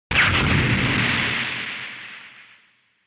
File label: Retro Anime Explosion High Short